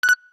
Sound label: camera focus, ui; Alcatel OT-903